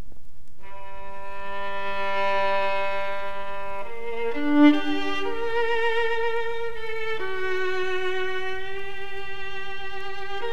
擦弦; A Amati; 弦鳴楽器

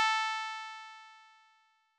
調波構造音（10～30本） 高域, 仮想ピッチ; 音の高さ; 高域下がり・時間減衰波

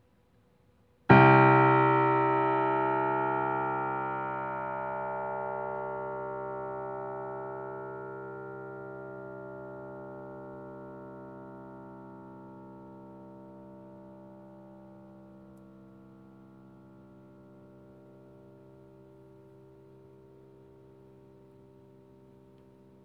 C2, 打弦; 弦鳴楽器

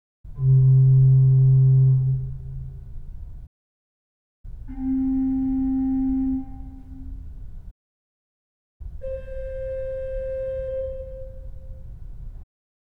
Organ レジスタ; 気鳴楽器; レジスター１（フルーパイプ）

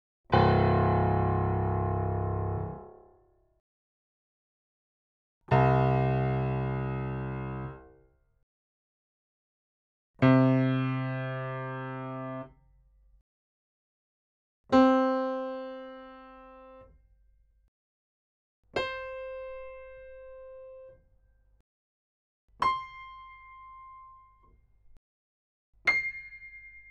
Pf, 打弦; C1～C8; 弦鳴楽器

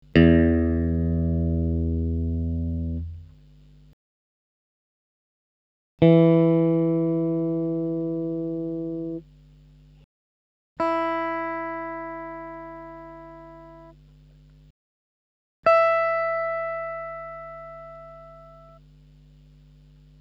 エレキ・ギター; 電鳴楽器; Ｅ2-E4

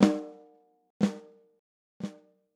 スネアの接触／非接; 膜鳴楽器; 打奏; スネアの接触／非接触

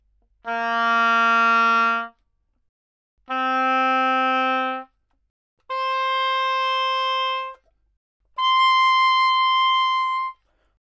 オーボエ, Double Reed; 気鳴楽器

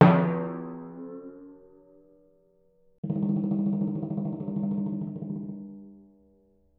Timpani 奏, 膜鳴楽器 打奏; Timpani-奏法と打叩強度による違

